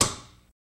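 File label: Electric Switch Click; switches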